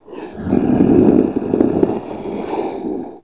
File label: growl